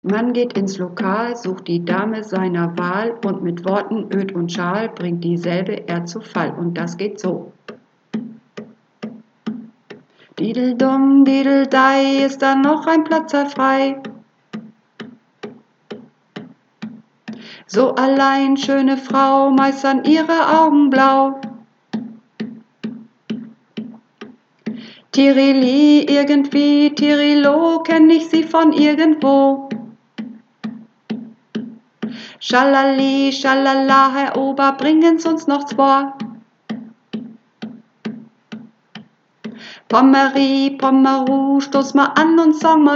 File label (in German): Hohe Stimme, Uebungsaufnahme